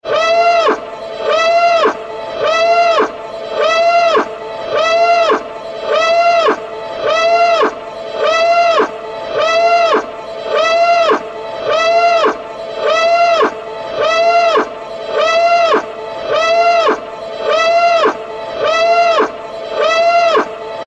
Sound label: klaxon ringtone; star-wars